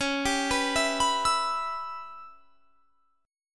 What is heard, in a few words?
Db Minor 7th Flat 5th Db down, chord; arpeggiated